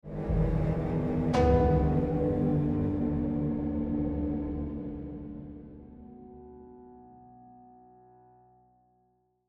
Tension; AB Tension 2 Block Match Over

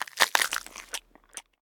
Zombie Eat; Zombie Catchers

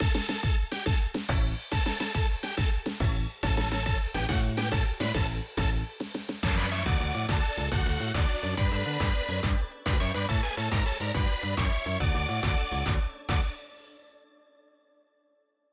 Playball

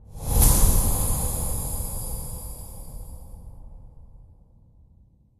Swell; friday the 13th killer puzzle

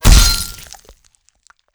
bullet hit player head

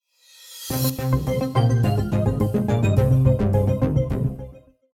Level Complete Jingle; Spacebound

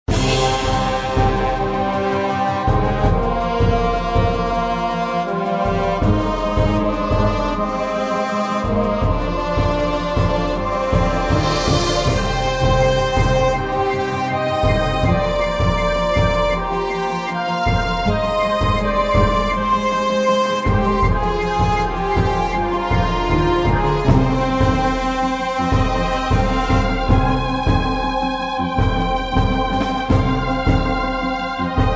dungeon battle; Chibi Knight

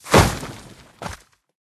Snow Golem Awake

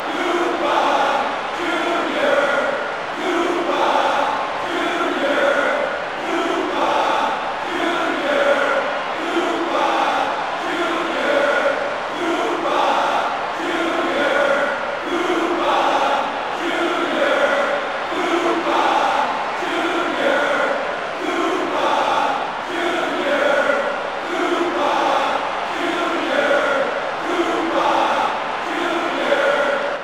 Crowd Song; Bowser Jr.'s Crowd Song (JP); mario strikers charged gamerip

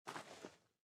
step sand

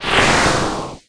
Cannon Charge

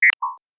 Digital Click; Robobeat

